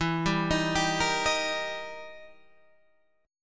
E Minor Major 7th Flat 5th; chord shape

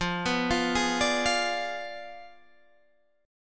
Ab Diminished 7th F

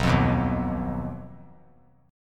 B Major Flat 5th B, chord; BMb5; strummed

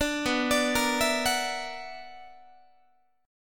B Minor Major 11th, BmM11 chord, Guitar; arpeggiated; JGuitar

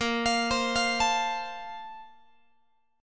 Bb Major 7th Suspended 2nd, Guitar; arpeggiated

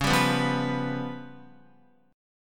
Db 7th Flat 5th, Guitar; Db7b5 strummed; JGuitar

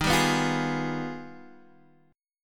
G sharp Minor Major 13th; x,6,5,4,6,4

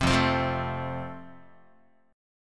F Major, Guitar; strummed

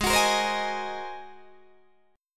G sharp 9th, Guitar, Open D

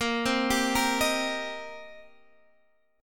D sharp 6th, A sharp

